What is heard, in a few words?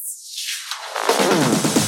Fills 128BPM, Clubsounds